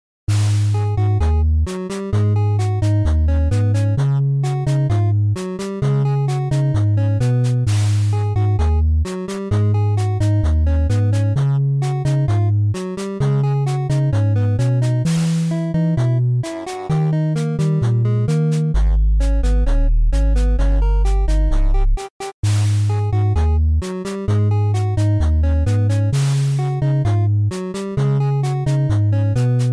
8-bit; Sans

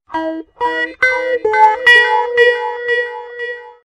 Sms Star Tone

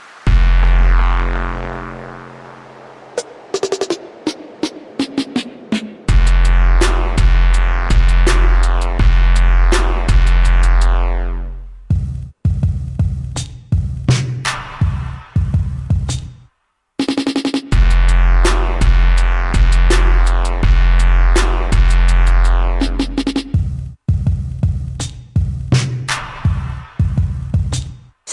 Massive Bass Drop

